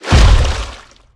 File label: tank walk water, footsteps; player; source engine; left 4 dead 2